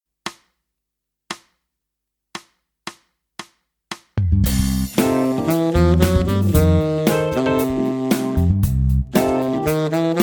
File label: Chrome Funk Tenor